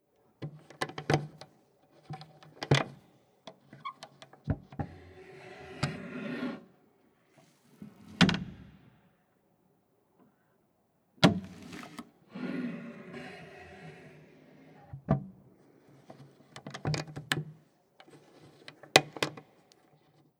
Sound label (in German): Damensekretaer Tibattant Klappe oeffnen schliessen